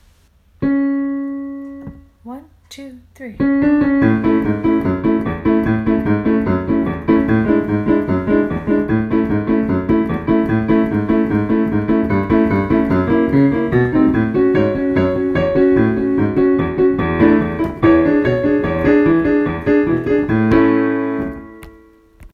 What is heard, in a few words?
Accompaniment